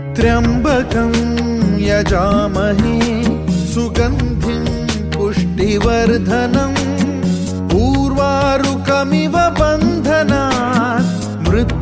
maha mrityunjaya mantra ringtone

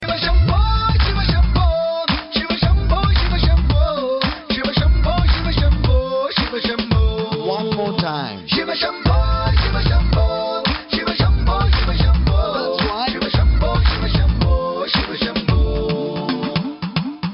shiv shambu remix ringtone

